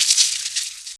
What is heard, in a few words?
dry bone clatter shield b